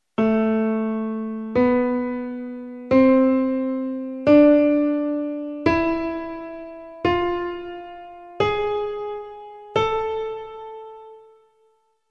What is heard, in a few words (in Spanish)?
escucha la armonica